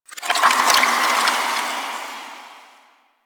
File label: Obelisk Stone Start Move; Warframe; Infested Eris